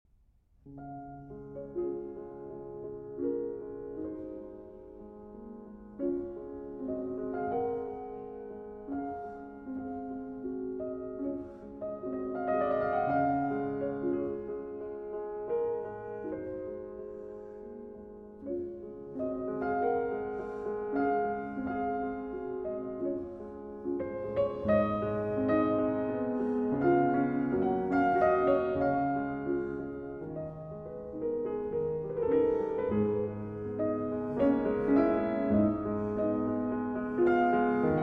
Preludes, Op. 28 No. 15 in D-Flat Major Raindrop. Sostenuto